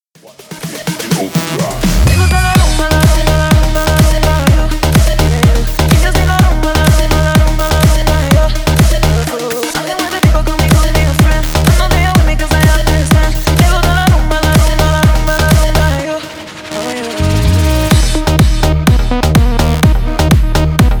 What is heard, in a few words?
rumba